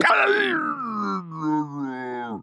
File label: die; reaperman; giants